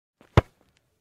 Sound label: kicking a ball